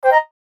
chime light; Chimes; Multimedia; Blastwave

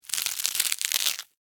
Bite Chew Chomp, Single; Foley; Eating Sound Effects; Human; Blast Wave

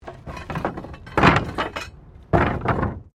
Wood Metal Move; Material components for constructing more complex sounds; Materials

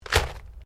Organic stab; Various Organic Foley sounds; Stabs and Slices; Combat

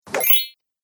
Pantomime Fairy Attack, Cartoon; sound design

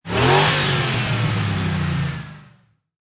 Truck 4.7 Liter Rev Engine Perspective; Auto, truck, motor. 4.7 Liter Truck / Lorry. Rev engine one time, engine perspective.; Car Sound Effects

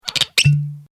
Glass Liqueur Bottle Opensqueak; Materials

